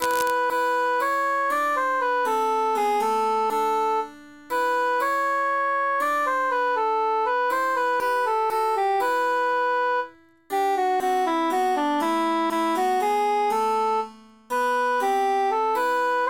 transposed cantus emphasized; la bionda trecca

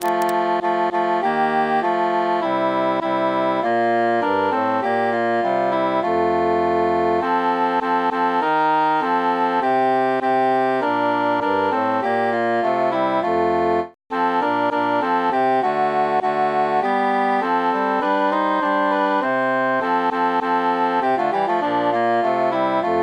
altus mute; matona mia cara

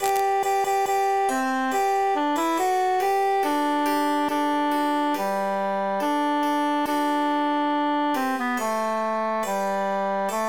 bassus emphasized; come merry lads

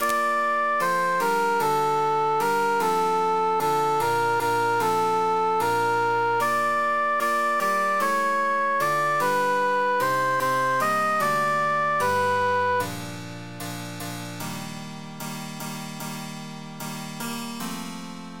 cantus emphasized; fa una canzona

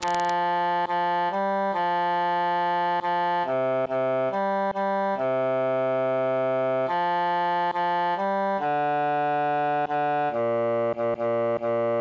bassus solo; tant que vivray

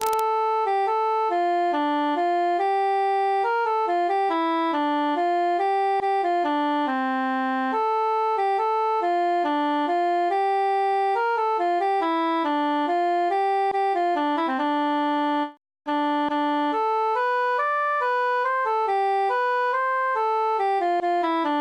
cantus solo; stella splendens

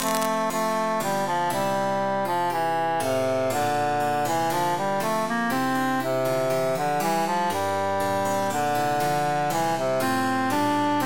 tenor emphasized; silver swan

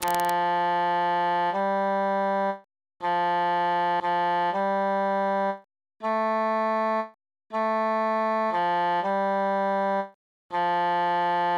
tenor solo; post partum virgo ave regina veritatem

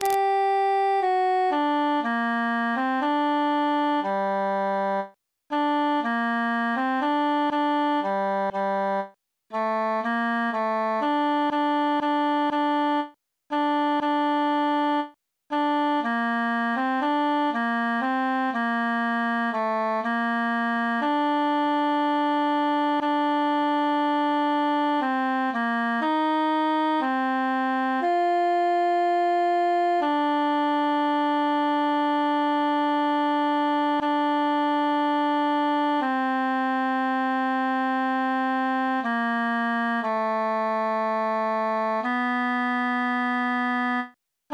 tenor solo; adew sweet amarillis

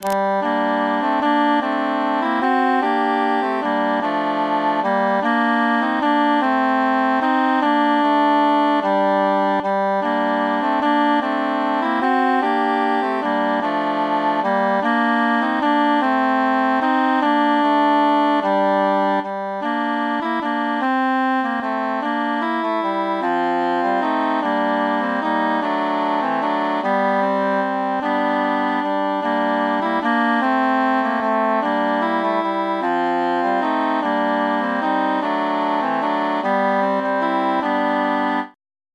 three country dances bassus mute

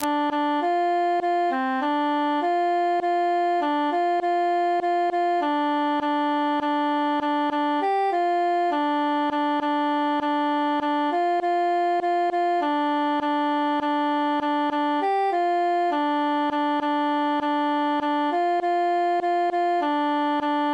tenor solo

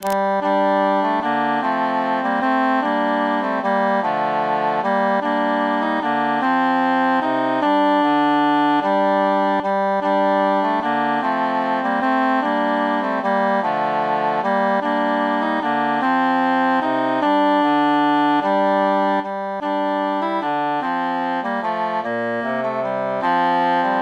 three country dances cantus mute

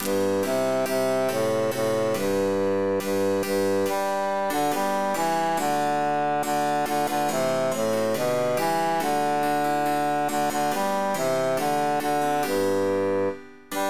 bassus emphasized; now is the month of maying

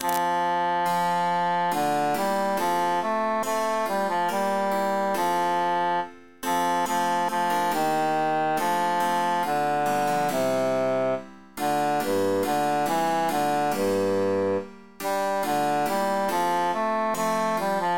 bassus emphasized; innsbruck

